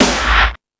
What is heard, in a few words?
Dance 2000; E-MU Pro-Cussion